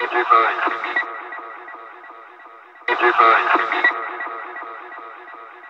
VOX, Jungle; E-Lab; XTC Files of Jungle

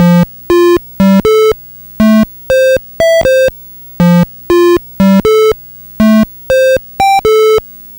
Atari 2600 Synth; 8-Bit; Chiptune

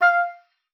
F5, OB D.AMORE; Xsample VOL-7

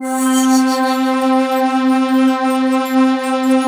pad, 162 BPM, Drum & Bass; Ueberschall; Drum & Bass Resonance

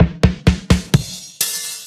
Fills 128BPM, Clubsounds

